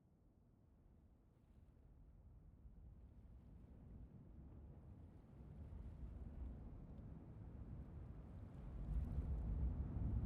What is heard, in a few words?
Rainstorm; Live Theatre Portfolio